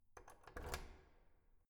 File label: door insert key